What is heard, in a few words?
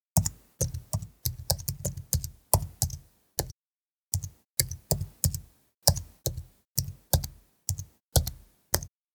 pc typing